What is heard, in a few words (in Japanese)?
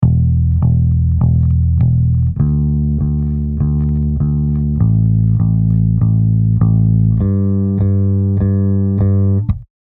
ツーフィンガー